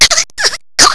DROWN; speedy

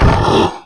fall; opentdm; cero

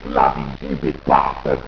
DROWN; dalek

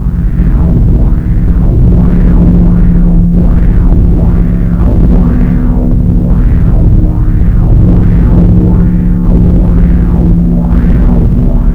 techno drone

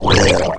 gurp; vortex; sorbogon

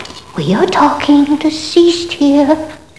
death

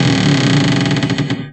door creak; poesounds

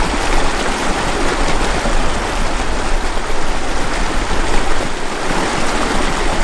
temple river

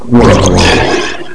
DROWN; Rhino

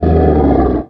pain md; rogue; carrier

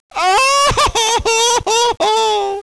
death; jack

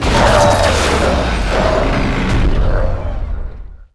death; battroid

